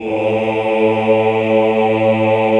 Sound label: CHO, Roland; Sound & Vision - Gigapack I CD 2 (Roland); Mix-Combi 1